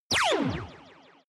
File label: laser pistol shot; krieg-waffen; soundpool